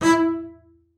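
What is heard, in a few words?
marcato E4 right, bass spiccato; Xsample VOL-5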